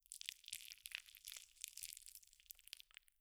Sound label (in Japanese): 挿入音